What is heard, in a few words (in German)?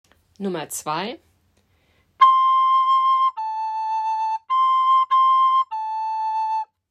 Flügel